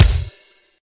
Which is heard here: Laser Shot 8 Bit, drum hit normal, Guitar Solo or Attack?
drum hit normal